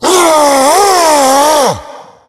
hulk yell, tank voice yell; Left 4 Dead; Source Engine

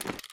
taunt equipment jingle; video games; source engine; tf

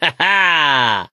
positive noise, mechanic, survivor, voice

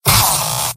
pain sharp; engineer; mvm

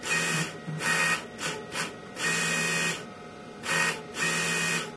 computer tape; Source Engine; tf

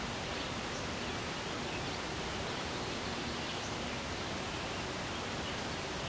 woodland ambient; nature; Source Engine; cstrike

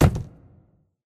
phys cube, physics

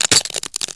glass strain; physics; Source Engine; cstrike

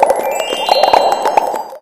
rainblower start; tf

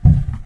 plastic barrel impact soft; Source Engine; video game; physics

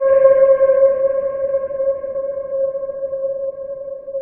city siren loop, alarms; ambient; source engine; hl2

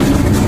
cart rolling start; Source Engine; tf

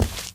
walk tile, footsteps

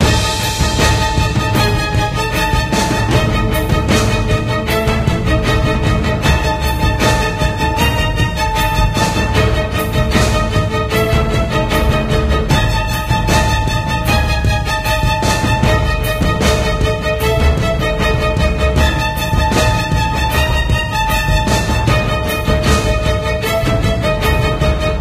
gas can of victory, music; Left 4 Dead 2; Source Engine; video games; scavenge

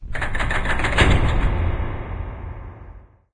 amb muffled lo mach

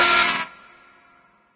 button synth negative; video games; source engine; portal2

